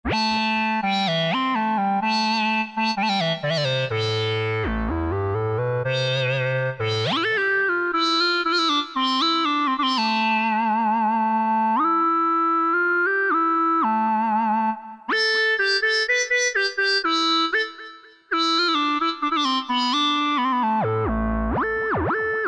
Gnome, Yamaha TG77; Factory Presets

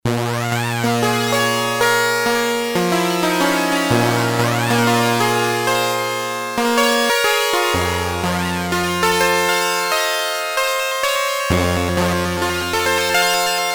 Sync Comp, Prophet-5; Sequential Circuits Prophet-5 (Ver.3); Factory Patch; File 3 Programs

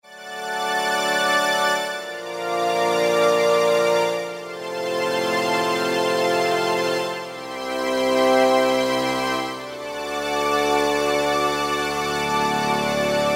pad Old Saws, E-mu PK-6

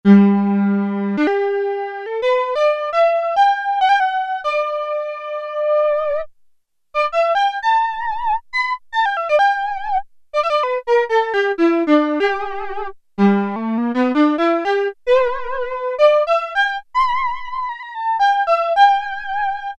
Solo Violin; Sequential Circuits Prophet-5 (Ver.3); Factory Patch; File 2 Programs

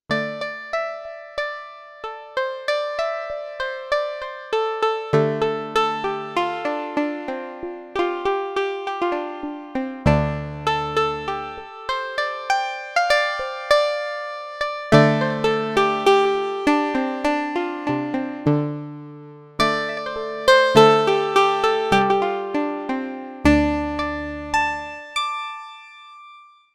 KOTO, Korg DW-8000; Factory Patches

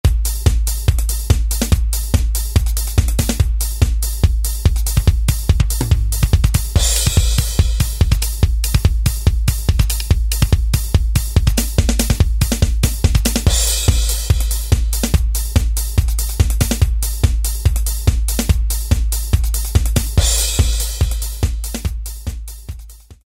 Tekno, Alesis SR-16

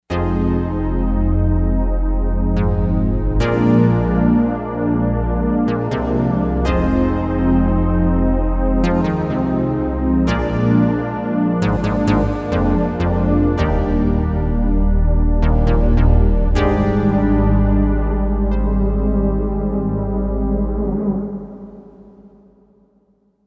Bell Pad, Korg M1Rex; Factory Patches

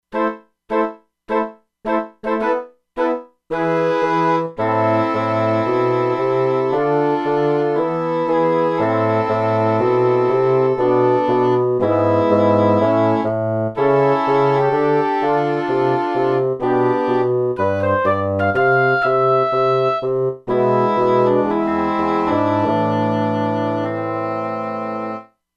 Chamber Wind, E-mu Proteus-2; Factory Presets

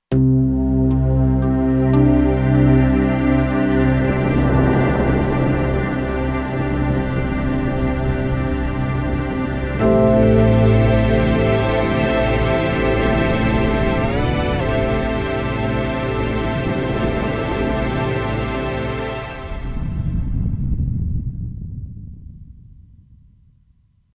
Ribbon Thunder, Kurzweil K2500X

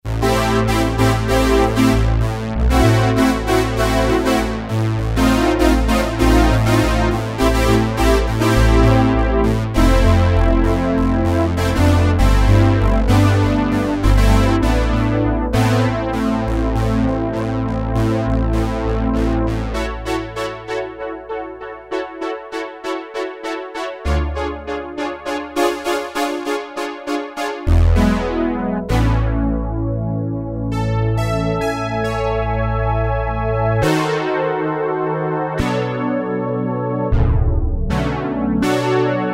Poly Synth, Roland MKS-70

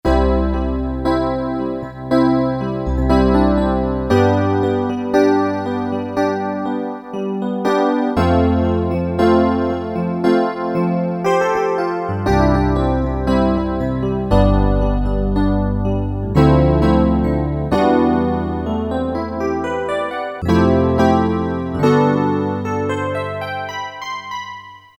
E.Piano, Korg DSS-1 DWGS synthesizer presets; Korg DSS-1 Sound Library

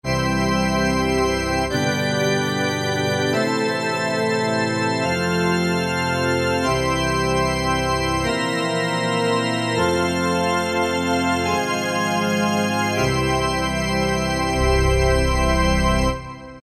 Full Ranks, Alesis QS8; Factory Preset examples

